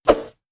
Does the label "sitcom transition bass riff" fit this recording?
No